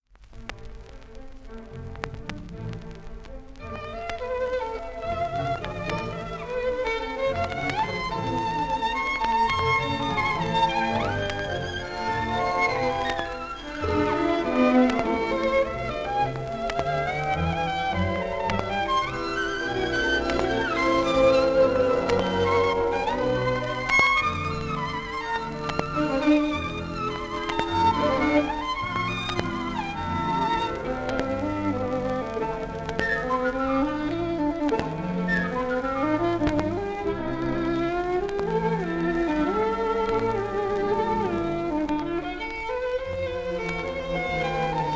Koncertas smuikui; Balsys

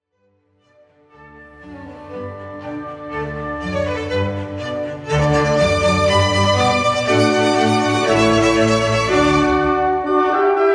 koncertas violoncelei; Haydn

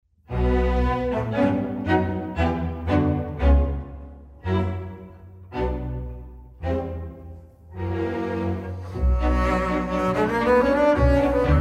koncertas kontrabosui; Bottesini

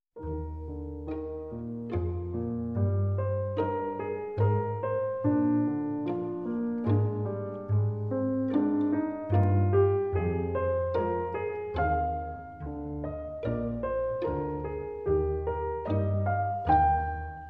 Koncertas dviems fortepijonams; Bach; BWV 1060

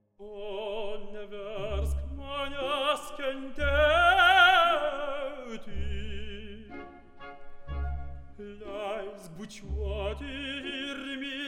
Kvartetas; Verdi

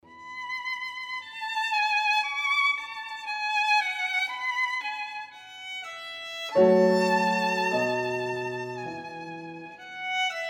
Koncertas; Mozart

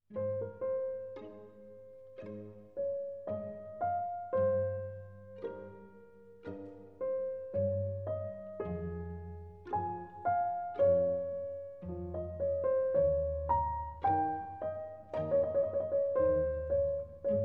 Koncertas fortepijonui; Bach; BWV 1056

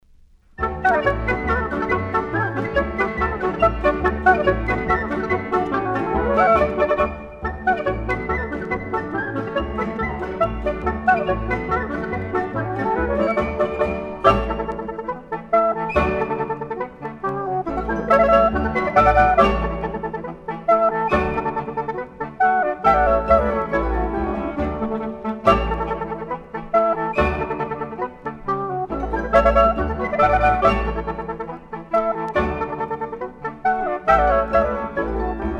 polka; Muleikų polka; Tamosaitis

